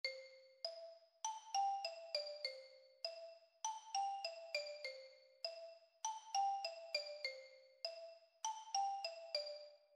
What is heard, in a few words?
Ostinato; composing at home